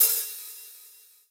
Open Hi Hat; Korg N1R

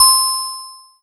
Kawai K3 Bell C3

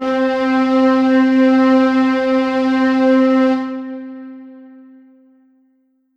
Ensoniq ZR-76 Dark Strings C4

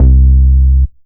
Korg TR Rack Pound Bass C2

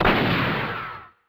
Western Gunshot

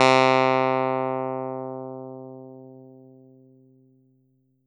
Roland JX-03 Clav C3

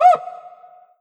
Roland SC-88 Mute Cuica

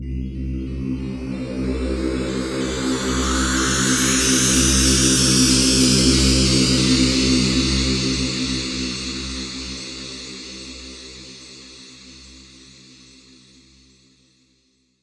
Korg 01W Ephemerals C2

